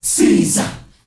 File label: SEE SAHH R, GRP CHANT; Spectrasonics; Heart of Africa 1